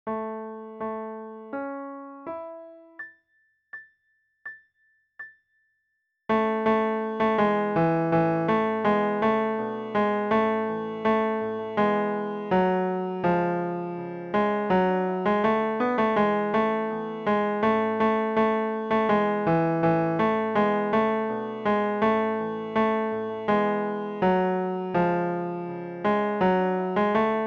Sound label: Bass